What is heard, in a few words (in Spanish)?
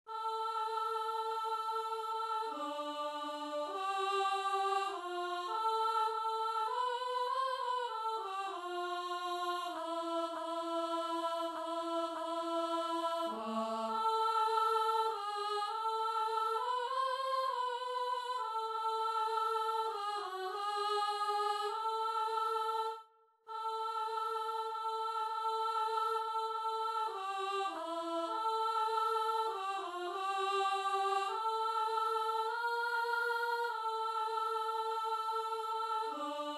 una hora soprano